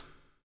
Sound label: BLUES TK, Backbeat; Spectrasonics - Backbeat